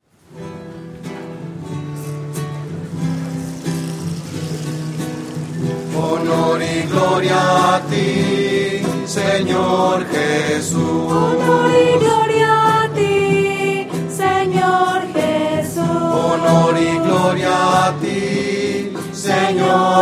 voces; misas 2012

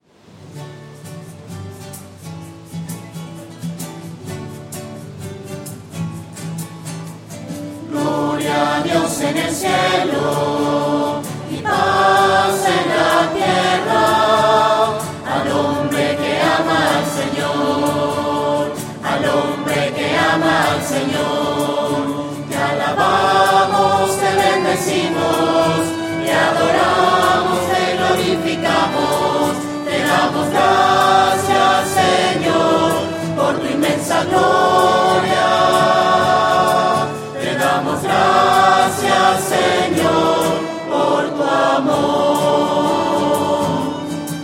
voces; misas 2012

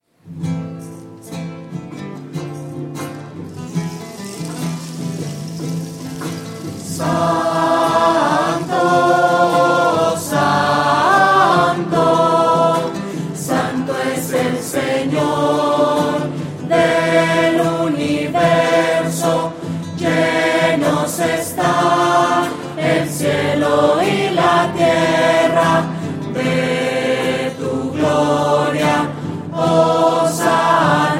santo lento y triste, voces

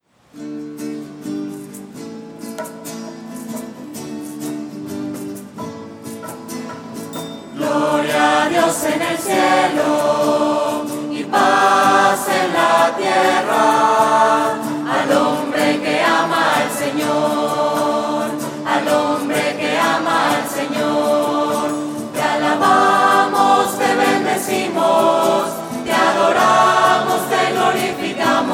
voces; gloria; misas 2012

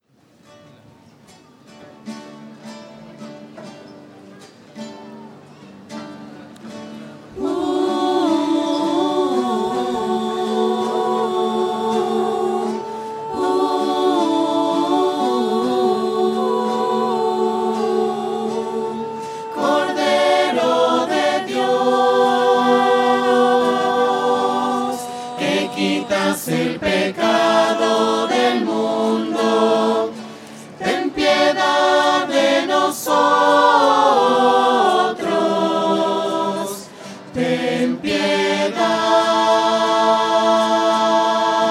voces; misa

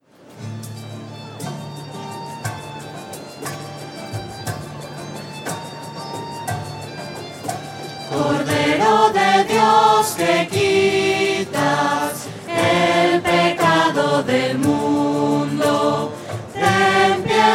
cordero de dios voces; misa

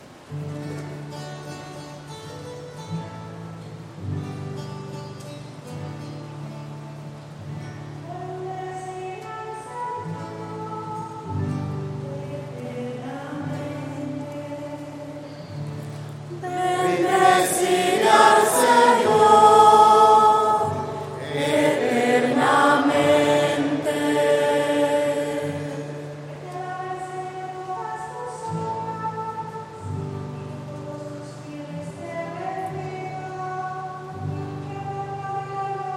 salmo 29 voces

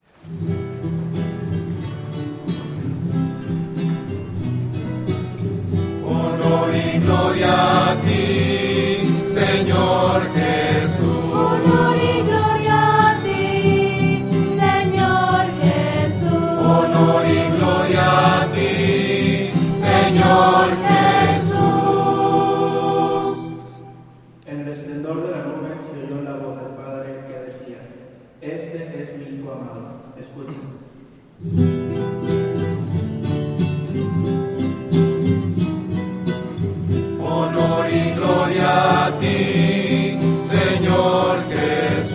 voces; misas 2012

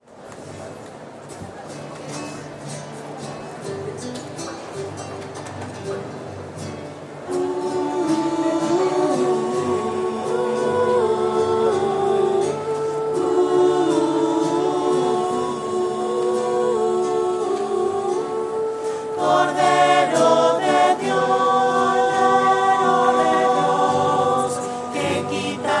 voces; misas 2012

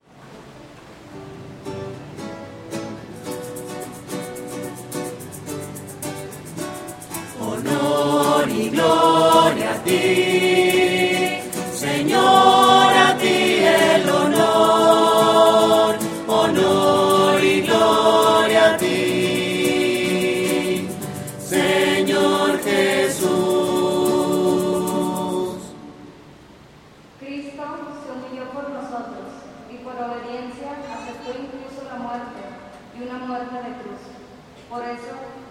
voces; misas 2012

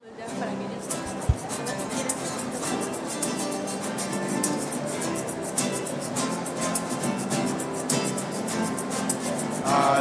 voces; al sennor invocar; misas 2012

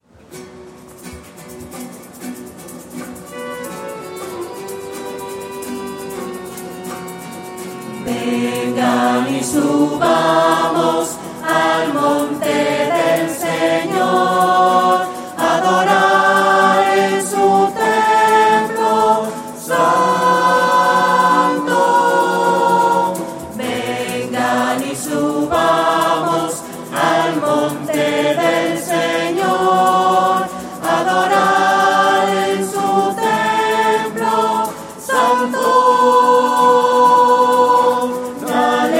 vengan y subamos voces

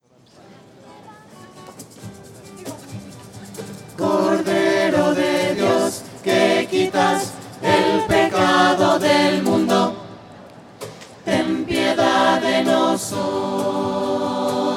cordero de dios voces; misa

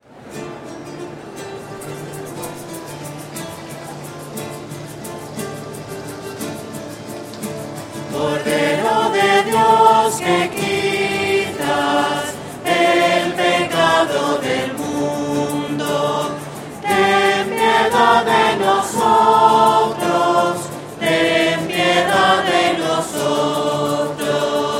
cordero de dios voces; misas 2012

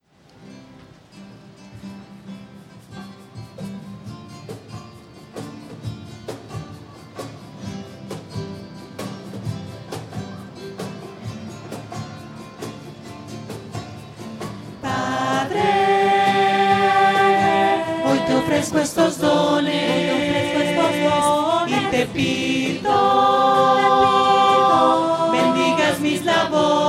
hoy te ofrezco estos dones voces; misa

